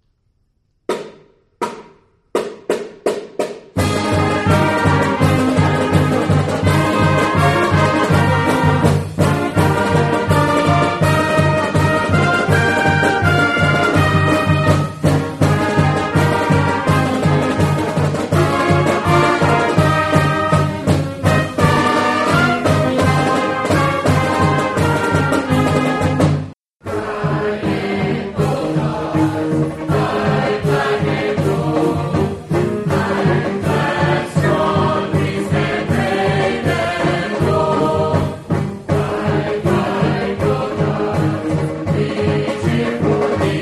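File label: Fight Song with Singing Pep Band; Bryant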